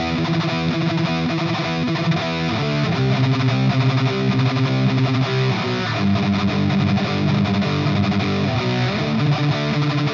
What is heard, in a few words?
EL84M, guitar; GR56 EL84M LEAD; TAD EL84M; GrandMeister Deluxe 40; 真空管